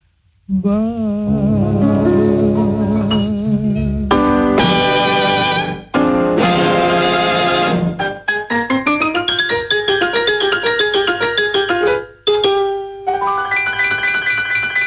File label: piano